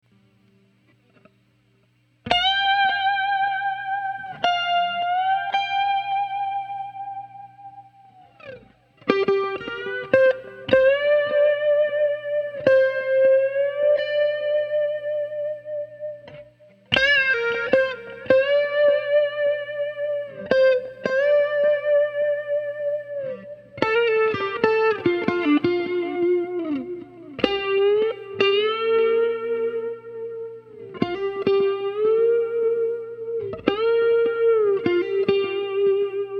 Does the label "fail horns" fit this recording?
No